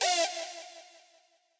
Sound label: stab